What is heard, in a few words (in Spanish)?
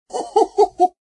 Sonido risa leon; tono de llamada; Rumble Stars; Portero